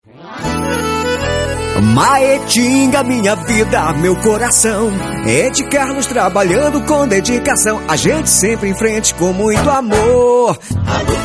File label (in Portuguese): Jingle politico